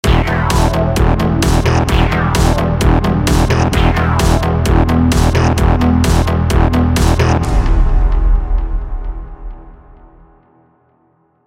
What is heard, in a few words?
intro tune intense